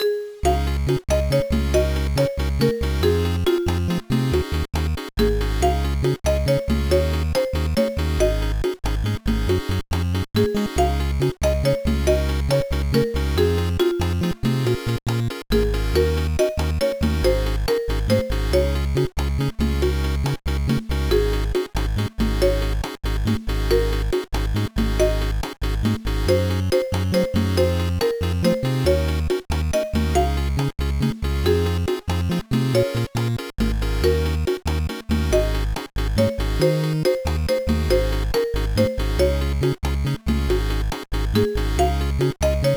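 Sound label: background music; No No No Puzzle Chailien; GBA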